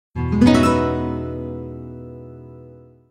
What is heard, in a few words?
Guitar SMS; Nokia; 8600 Luna